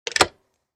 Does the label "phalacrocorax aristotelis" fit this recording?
No